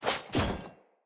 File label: Foley Heal; Enemy; Sinecure; Banneret